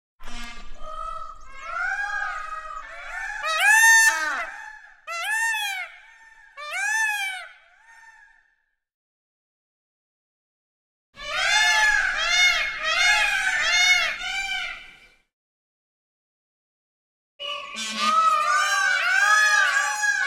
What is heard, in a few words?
Peacocks Cry X 3 Bird; Hollywood Edge Historical Series; Traffic Free BG's & FX